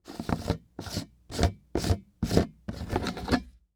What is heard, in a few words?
Household Jar Open Screw Top